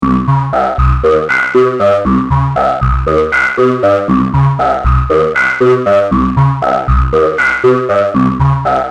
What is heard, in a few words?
vocal; synthmodules